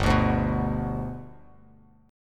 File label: G Major G down Bass Standard; G strummed; JGuitar